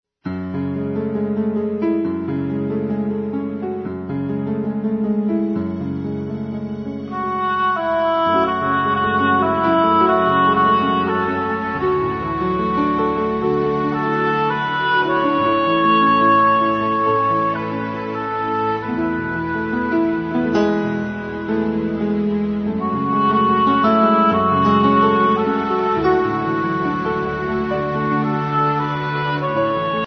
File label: instrumental